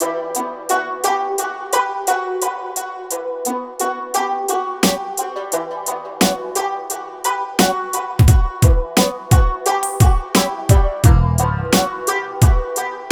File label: 087 Emin, Hip Hop Exotica; Apple Loops; Big Fish Audio